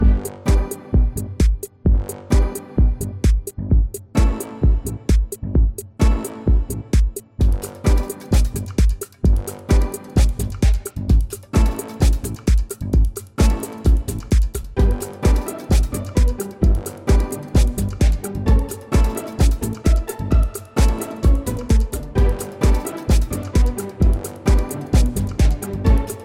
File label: Casual Theme Looped; Dual Blade: Battle of the Female Ninja